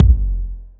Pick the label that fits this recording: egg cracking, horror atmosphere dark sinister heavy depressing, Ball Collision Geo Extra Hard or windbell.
Ball Collision Geo Extra Hard